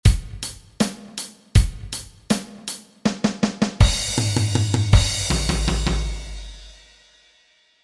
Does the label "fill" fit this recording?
Yes